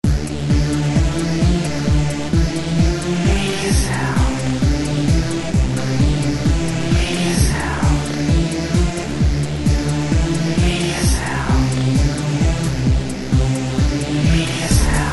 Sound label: House Dance 131BPM